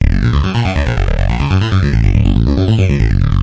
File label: 140 eurotrance synthline; SYNTH AND ELECTRONIC LOOPS